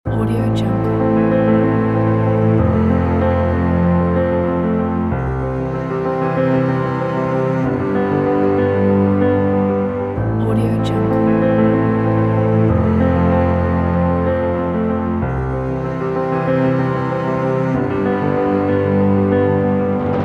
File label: an inspiring cinematic